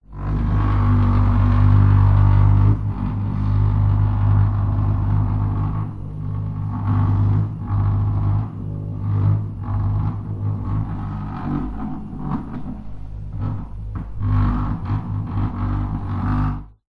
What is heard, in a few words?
industrial power tool vibrate through wall other side pov; zapsplat